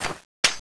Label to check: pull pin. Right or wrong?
Right